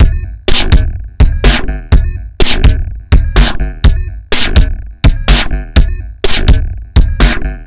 Drums Strange

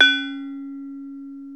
Bronze Gamelan

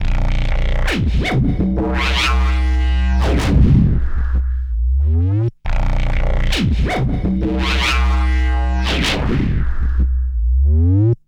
Mad Bass, Drum & Bass; Fluid Dynamics

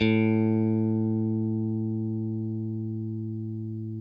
OPEN A, Guitar; Quantum Leap; 90's Sample CDs

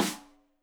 Rim Shot Stereo; Deeply Sampled Drums; SMDrum

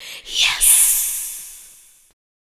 VOC; Best Service ProSamples vol.48 - Disco Fever; AKAI

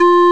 recorder; Amiga Soundtracker Sample Packs

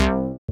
SYN OLD SY, dance synth; Sound & Vision - Gigapack I CD 2 (Roland)

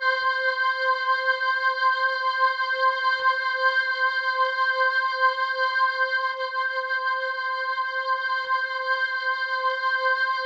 C5, Synth Leads

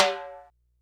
Yamaha RX-120 Timbale High

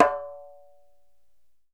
DJEMBE, African Drum

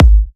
G#, kicks; markus hakala kicks

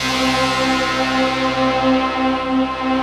rough stack; Optical Media International; Sonic Images Library

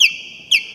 VIOLIN BIRDS; Best Service; World Colours

